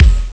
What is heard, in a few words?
bdrum; Amiga Soundtracker Sample Packs